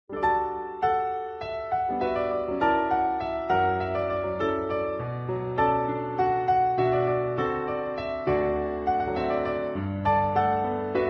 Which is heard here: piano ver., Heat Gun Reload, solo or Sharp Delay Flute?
piano ver.